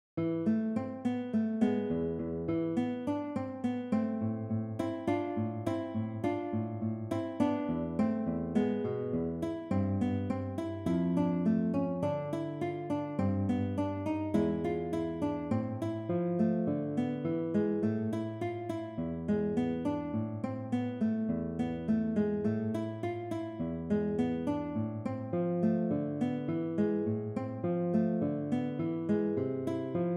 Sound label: Andante, konzertgitarre; Carcassi